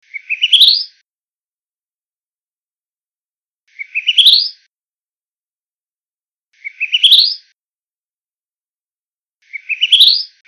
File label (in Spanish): vinchero